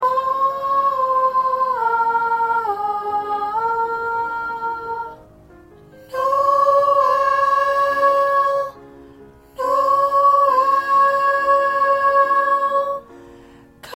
Soprano 1; Noel

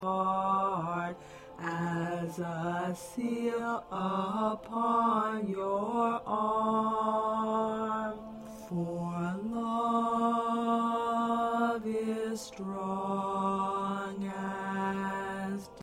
Tenor

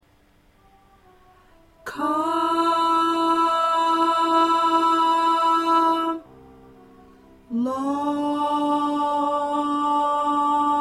Alto

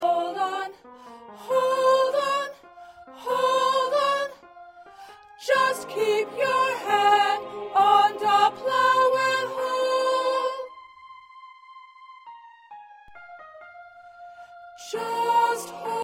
1st Alto

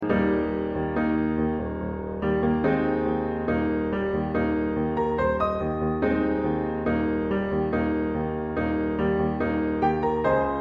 Piano

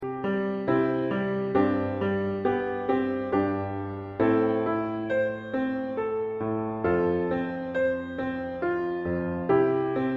Piano

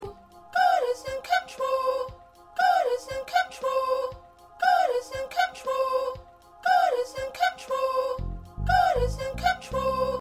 1st Soprano

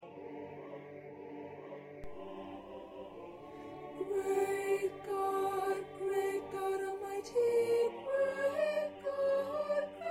1st Soprano

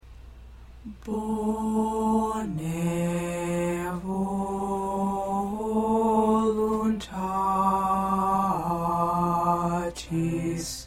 Tenor, choir

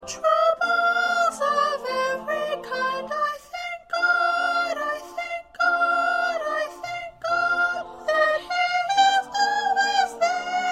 Soprano 1A

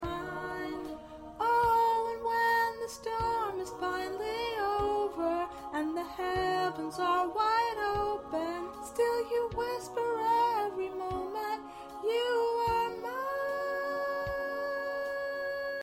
Lead

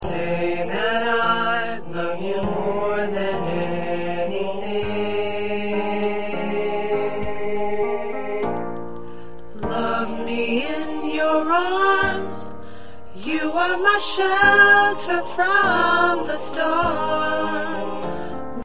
Alto, choir parts

